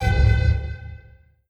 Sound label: army combat; Stellaris